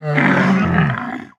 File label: Spitting Camel Attack